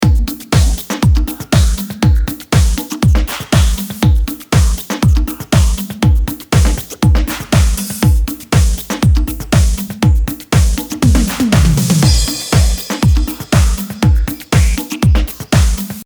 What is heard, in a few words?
beats; Aleste